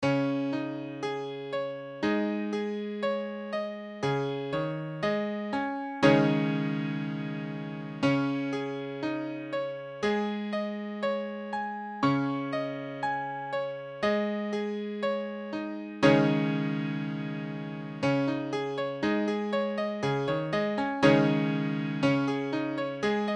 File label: Dbsus2, piano